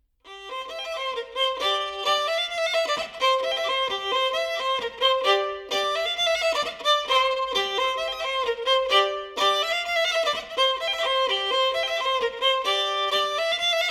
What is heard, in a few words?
Polka